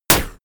short metal decay; hits explosionen; metall

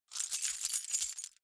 key ring large rattle hard; objekte; schluessel

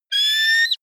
offspring eagle call, voegel; natur tiere

